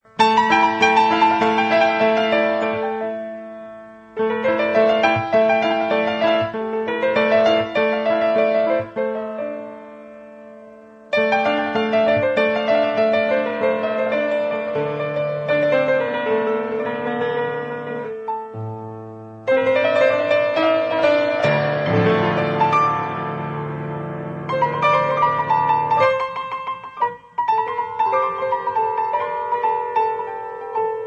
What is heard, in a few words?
Saz O Avaz Dashti